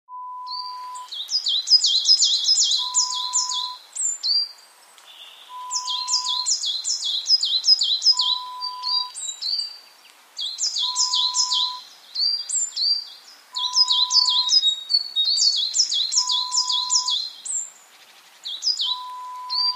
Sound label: Forest